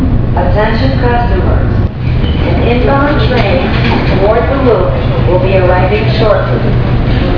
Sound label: Inbound Train